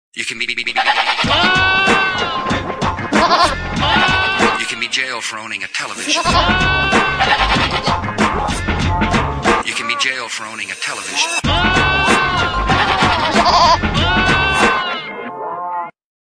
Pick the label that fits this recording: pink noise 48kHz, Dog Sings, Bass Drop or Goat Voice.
Goat Voice